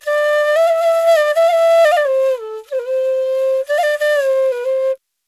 ETHNO EXTRA 90; ethno2

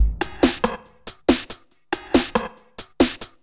TECHNO BEATS 140

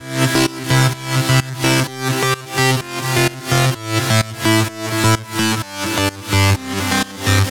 synth 128 C; Electro; Anthemic; synth loops

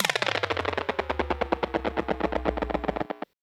Industrial 140

